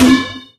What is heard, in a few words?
phys bouncy cube